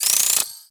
Credit Pickup Pile Medium; Warframe; Pickups

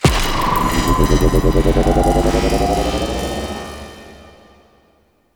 Console Un Stalled; Warframe; Ambience; Infested; Golem Trial

